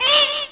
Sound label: Baby Mario cry; SMA3